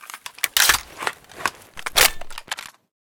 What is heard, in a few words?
l85 reload, weapons